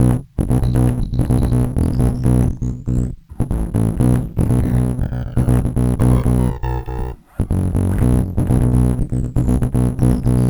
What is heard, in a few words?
El Distorto, Basses